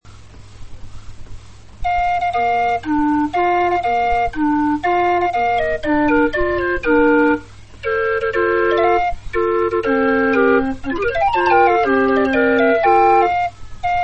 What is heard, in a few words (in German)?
floetenuhr